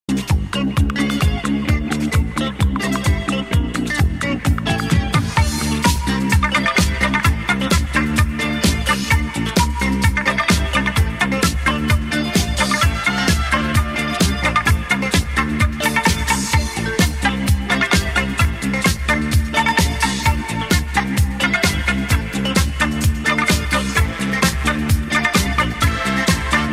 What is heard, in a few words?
instrumental